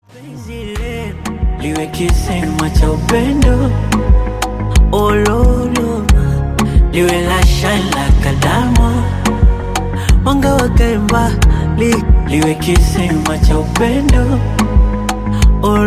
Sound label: Melody